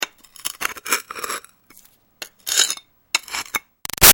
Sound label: Foley Rock Scraping Archaeology Moves; Materials